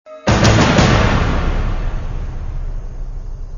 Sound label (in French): battement toc toc violent